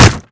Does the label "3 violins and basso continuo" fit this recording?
No